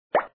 burst; cannonball-crisis; Puzzle

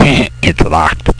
locked!; Escape Beyond 2001 v1.0

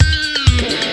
Dig It (guitar)

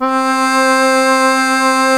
Accordian, Organ; GMPlay

